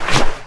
Clothing; Player; Footsteps

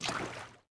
water wade shallow

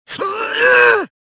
choke; luke

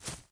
footsteps grass

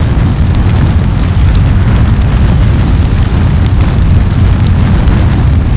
fire; EnvrntFX; XBOX; Hunter The Reckoning Redeemer

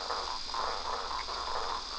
swamp; Ambient; MX vs ATV Unleashed; XBOX

